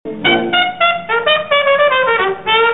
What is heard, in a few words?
blues major language